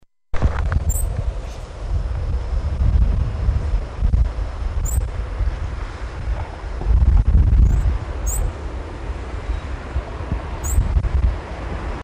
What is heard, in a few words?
little inca finch